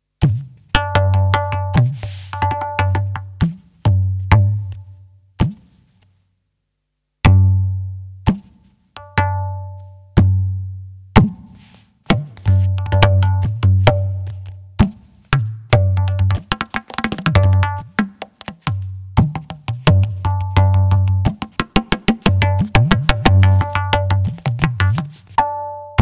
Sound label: wavedrum tube clipper; battlecomp vintage